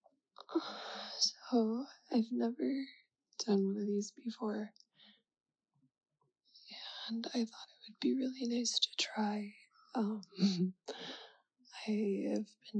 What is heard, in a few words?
Ramble, moaning; Ramble-Tease; First attempt; New Toy; Please use me; Very Needy